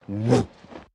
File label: Throw; Baron